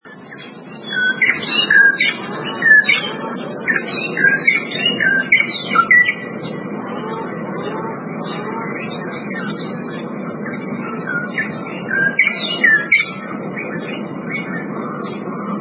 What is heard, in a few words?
Dawn chorus in Zimbabwe